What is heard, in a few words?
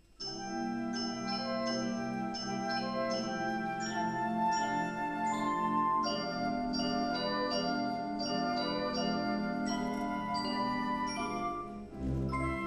Blithe Bells; Grainger